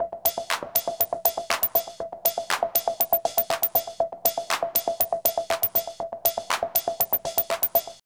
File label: LOOP, Tekno Industrial; East West